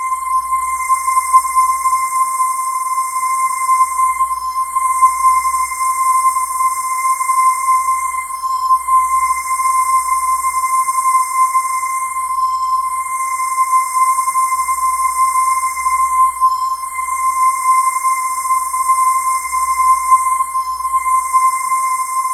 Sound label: CHRVOWEL; MORPHEUS